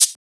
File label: Shaker; FLPRO